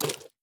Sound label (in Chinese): 犰狳进食声